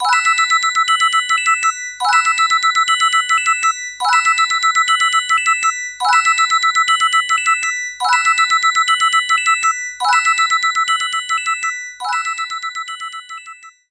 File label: Jingler